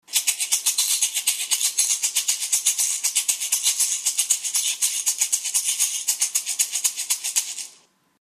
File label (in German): Holz Shaker Ei